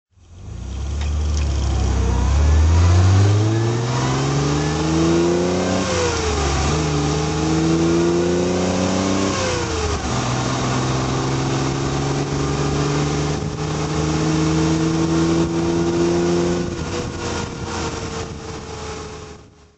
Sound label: healey 1006 drive